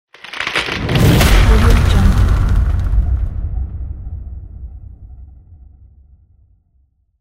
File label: ice cracking whoosh hit